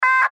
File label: Radio Morse Code; Weak Signal Letter T; Warfare; Blastwave